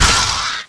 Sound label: houndeye die; gearbox